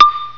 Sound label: Xylophone high d; Troubled Souls